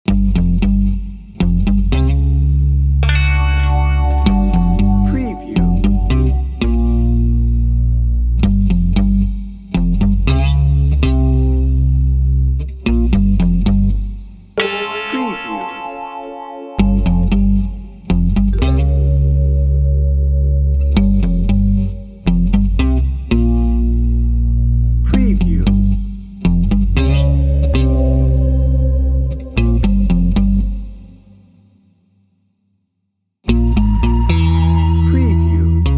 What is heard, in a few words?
trouble ahead no perc